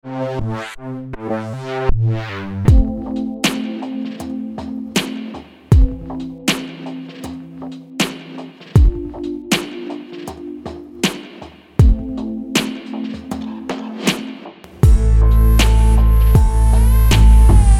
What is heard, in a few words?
INDIE DOWN TEMPO